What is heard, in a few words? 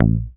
HOFNER MUTE, Bass